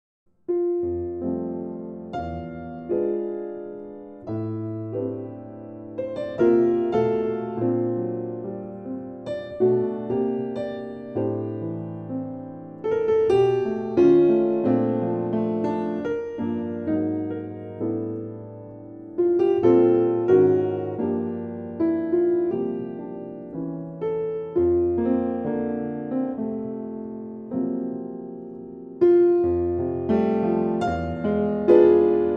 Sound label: Piano Recording